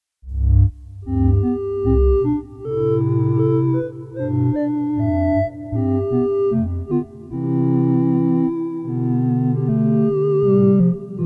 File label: chorale fantasie